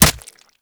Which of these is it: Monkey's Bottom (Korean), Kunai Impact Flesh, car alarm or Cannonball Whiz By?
Kunai Impact Flesh